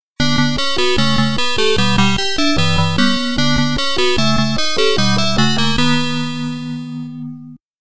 Game Over, FM

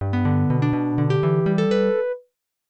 Floor Start Music; The Return of Ishtar; Fujitsu FM77AV

